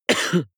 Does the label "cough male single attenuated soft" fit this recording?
Yes